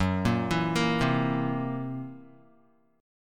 Gb 7th Flat 5th, Gb7b5 chord, Guitar, 4E,4A,5D,5G,5C,6F; arpeggiated; JGuitar